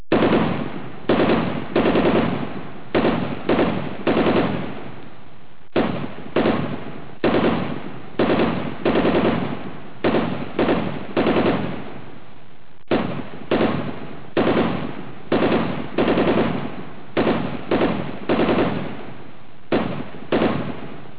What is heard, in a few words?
machinegun; powerpoint